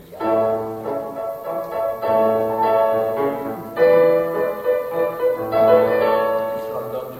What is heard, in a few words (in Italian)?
menuetto